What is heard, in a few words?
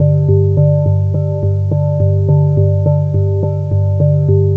Bass Patter